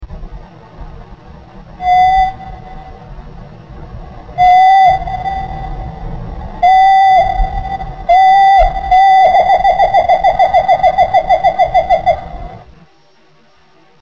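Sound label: wren slow; Wrentit song; song slowed 4x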